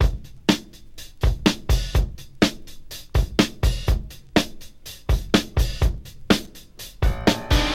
124 bpm breakbeat sample f sharp key, kick tuned to the F# note